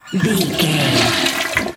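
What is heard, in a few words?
toilet flush lever water gurgle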